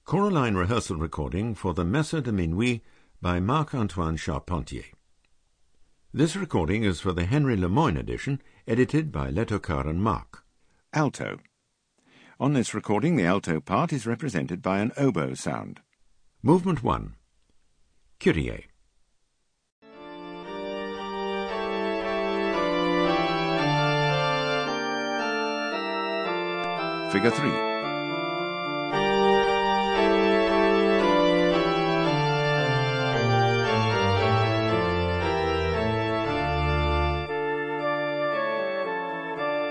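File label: Alto; Charpentier Messe de Min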